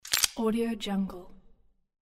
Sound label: coin insert